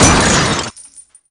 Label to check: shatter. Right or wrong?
Right